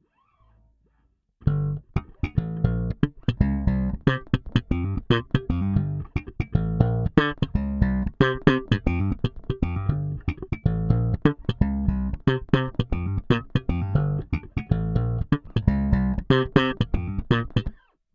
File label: thunder slap